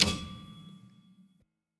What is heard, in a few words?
Single Iron Hit Hard